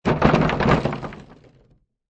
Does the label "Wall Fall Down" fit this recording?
Yes